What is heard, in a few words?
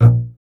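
string contrabass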